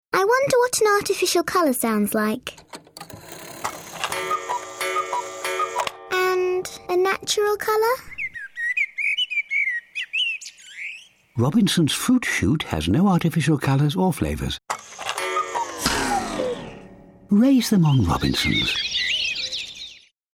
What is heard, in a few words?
cuckoo; 2008 robinsons fruit shoot